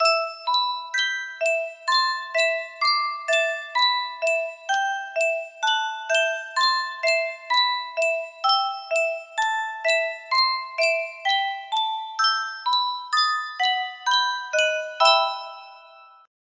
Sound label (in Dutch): yunsheng muziekdoosje, music box melody; efteling raveleijn